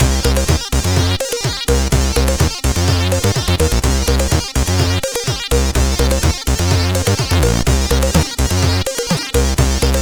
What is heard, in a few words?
Ice Cream Tune, CSG8580R5